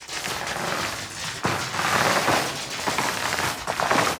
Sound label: damage vehicle; World Of Warships